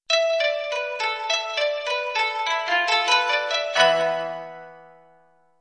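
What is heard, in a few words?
soft jingle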